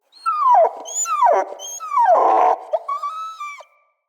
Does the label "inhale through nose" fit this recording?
No